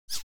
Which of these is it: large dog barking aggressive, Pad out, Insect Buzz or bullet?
bullet